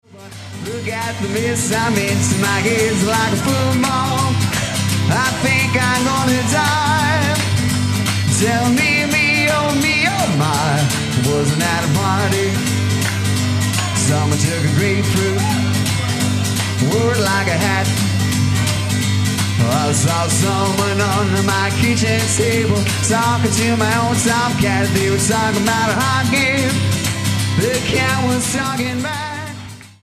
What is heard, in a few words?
irish mix